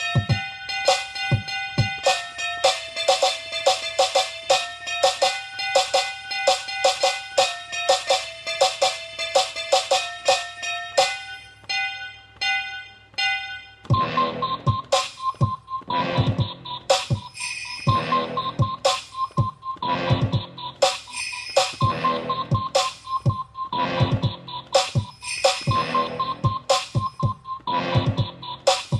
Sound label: christmas beat